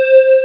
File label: sine; jazz jackrabbit 1